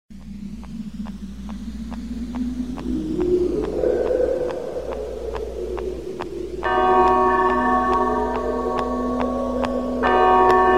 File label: Scary Walk